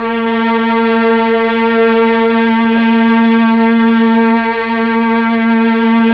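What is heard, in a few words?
Mellotron Strings A#3, Tron Strings; Keyboards of The 60's and 70's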